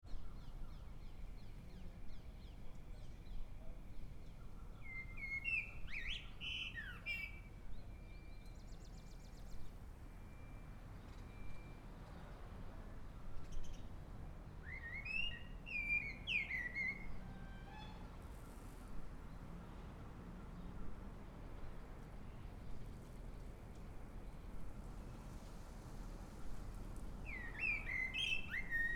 small town country birds car pass; atmosphere

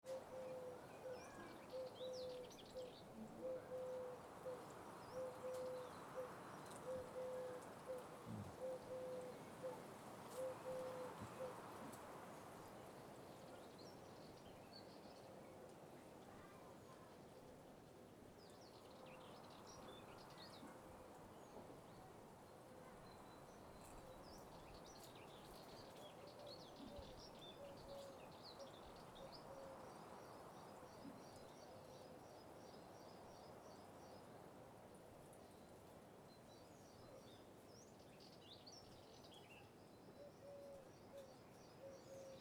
country birds crows flies roos; atmosphere

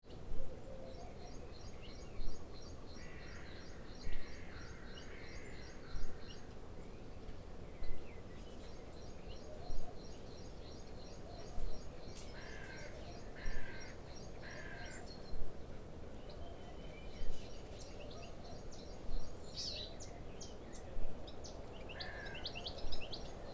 country birds pigeon rooster; atmosphere

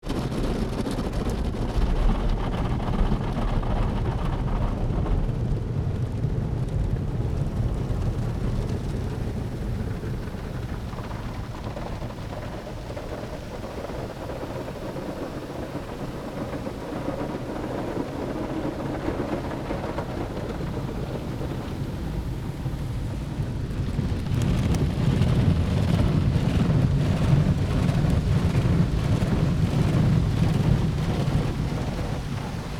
car clean internal; spoteffects

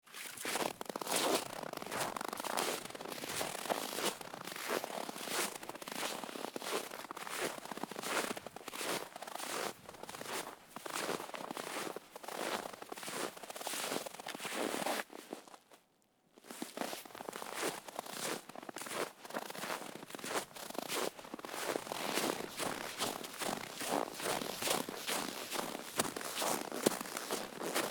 footsteps snow; foley

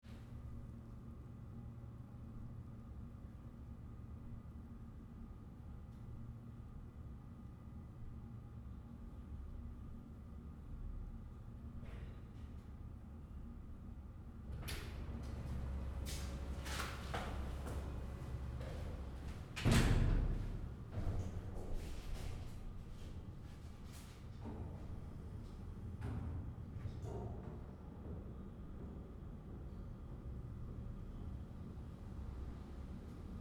lobby at office building footsteps; atmosphere

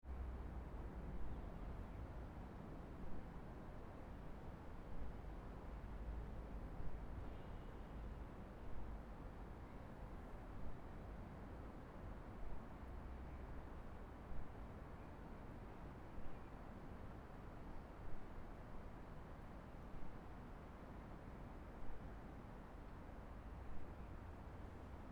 distant city 4pm quiet; atmosphere

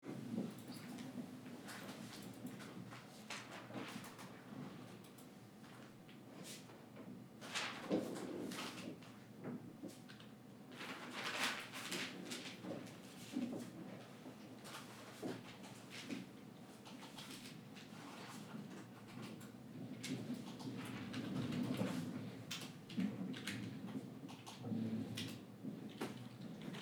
office newspaper coffee machine; atmosphere

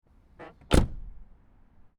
car door close external; spoteffects

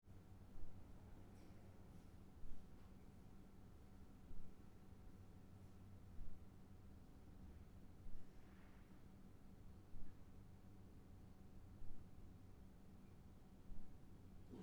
Room tone 11pm quiet; atmosphere

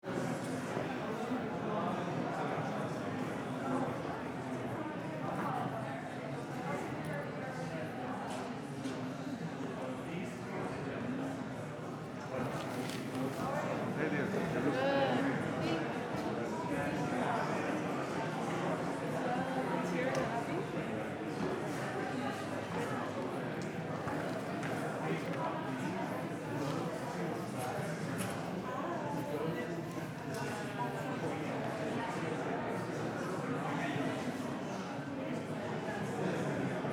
lobby museum english walla; atmosphere

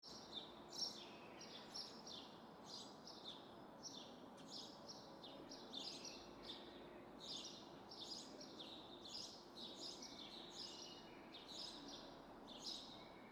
Town birds sun rise; atmosphere

